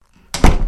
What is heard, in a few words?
Door Close